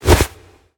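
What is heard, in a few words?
Power Melee; smite; god bakasura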